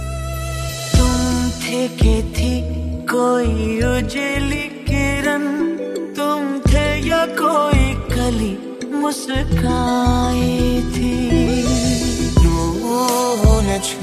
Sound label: Hindi Romantic Ringtone